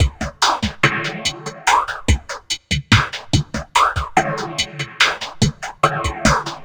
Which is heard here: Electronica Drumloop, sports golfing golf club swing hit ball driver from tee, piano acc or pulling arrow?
Electronica Drumloop